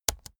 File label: keyboard laptop space press slow hard